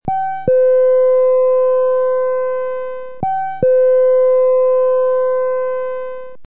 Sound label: Door Chime; signals